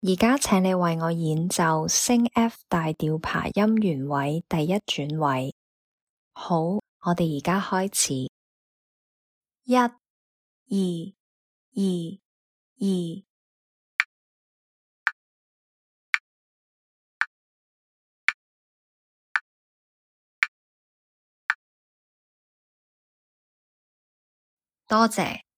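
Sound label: F sharp minor arpeggio first inversion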